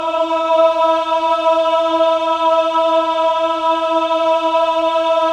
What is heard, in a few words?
men "ah", choir of ahs; Roland - LA Composer vol. 1